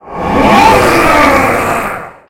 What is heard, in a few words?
roar, spectre; Fate The Cursed King; MONSTERS